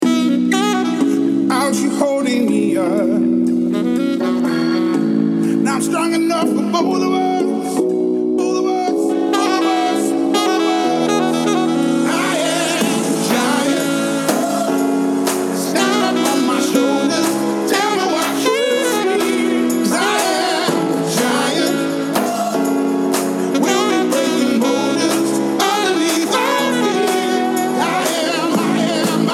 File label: sax